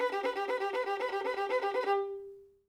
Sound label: violin